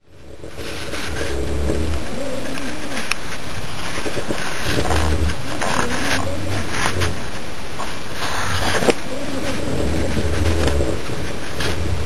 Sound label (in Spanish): Sonidos Uspallata